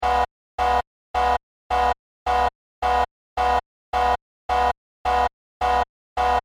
Warning Horn Warfare Industrial Sci Fi Control Room, Warning Beeps Inside Control Room; Warning Beeps . Control Room Warning System Or Alarm . Scifi Science Fiction; Control Panels; BBFX